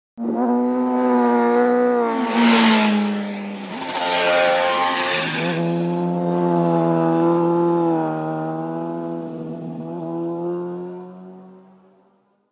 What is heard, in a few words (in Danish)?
Motorcykel ringetone